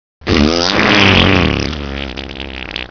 toilet

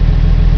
truck

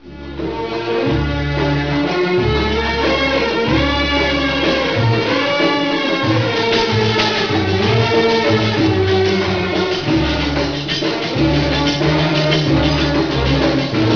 music

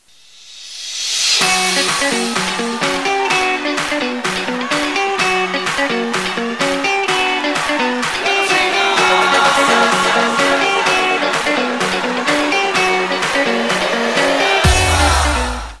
allies music; dod bluelg

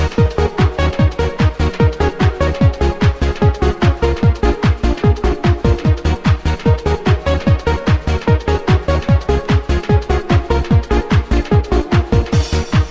party music

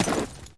player snow

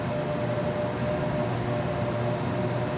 fan

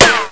ric, bullets

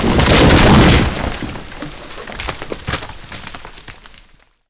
tree, ambience

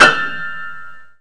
piano; polskiemiasto

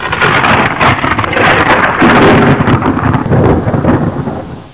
stuka thunder

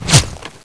whizz, bullets

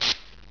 spark

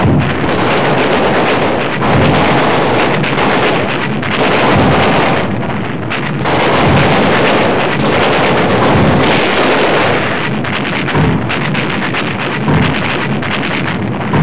flak shots

